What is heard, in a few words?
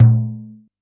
DJEMBE, Yamaha DD-65